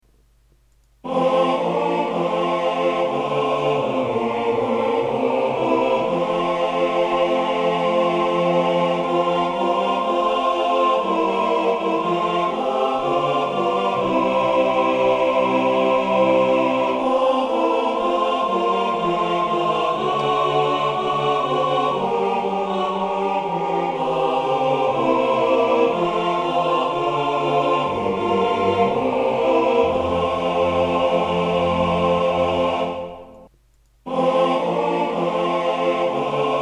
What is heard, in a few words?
let us break tutti